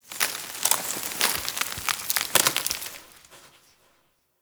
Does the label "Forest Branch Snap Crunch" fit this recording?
Yes